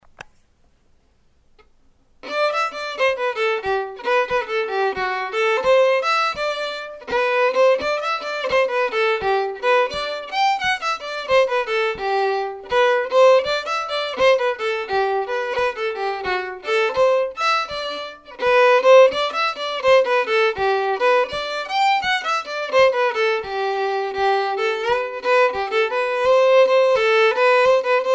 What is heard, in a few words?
Tarantella, fiddle